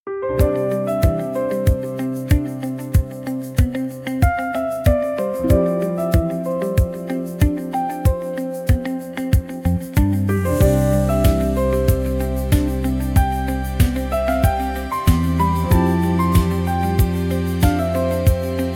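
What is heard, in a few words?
transparent dialogue, dlya ofisa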